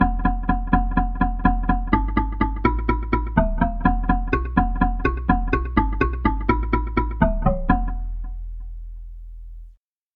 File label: kalimba bass